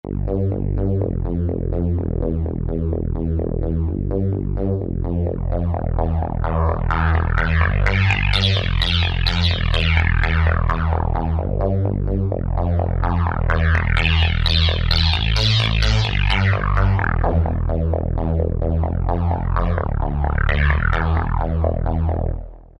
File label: Mod-Trance 2; Dance; Roland SR-JV80-06